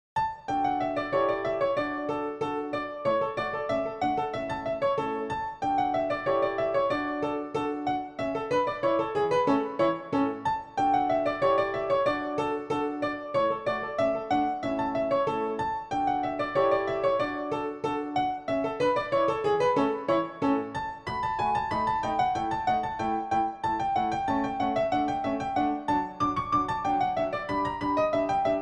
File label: Dance PianoTeq Bechstein